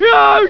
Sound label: argh